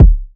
Kick, Drill Drum Kit; Drum One Shots; Zone; Cymatics; PARADOX II

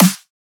Dubstep Snare; Ghosthack; Dubstep Sound Arsenal

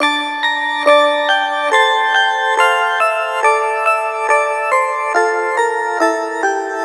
Riddim Synth Loop D 140; Nitelite; Ghosthack; Riddim Essentials 2020

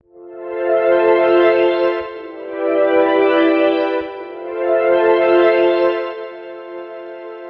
Loop Atmosphere 120 F, Deep House; You Also; Ghosthack; Advent Calendar 2019

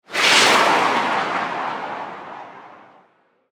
Freeze, Guns

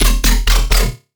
Bass Shot E Pterodactyl; Trap and Dubstep; Bass One Shots; Ghosthack; Free Trap and Dubstep Samples 2018

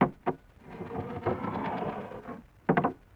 Glass On Bar With Slide; Vintage Cartoon; Foley

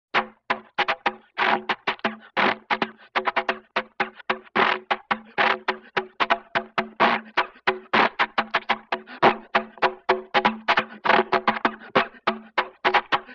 Wind Pipe Blow; Vintage Cartoon; Foley

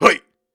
Grunts and Chants, Viking Vocal

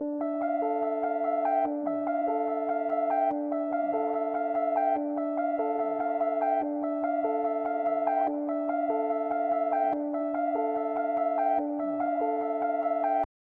145 BPM D Min High Bells; Melody; Trap; Loop Stems; Cymatics; 2022 Melody Collection - Trap Pt 1; Bloodline

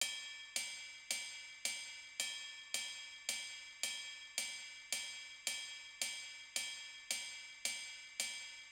Drum Loop 110 BPM Rides; Experimental Drum Loops; Loop Stems; Cymatics; Mystery Pack Vol 4; Weekend Drum Loop

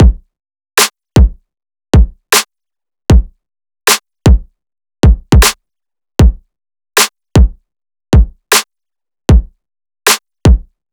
Drum Loop, 155 BPM; Full; Future Bass

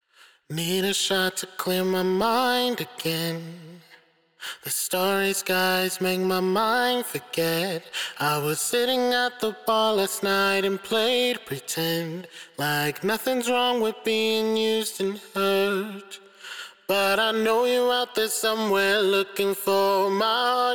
Main Vocal WET, Acapella, 110 bpm G Major; Ghosthack; Emotions- Acapella Collection

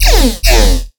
Riddim Bass Hit E; One-Shots; Bass Hits; Ghosthack; Riddim Essentials Volume 2

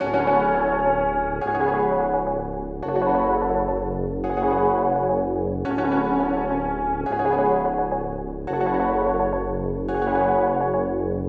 Dark Melody Loop 170 BPM F# Min; Cymatics; 2020 Melody Collection

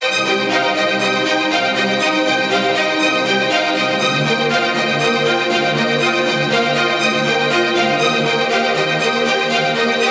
Ostinato - Cm 120BPM; Ghosthack; SkyRealm; Kit 23 - Victory On The Reach

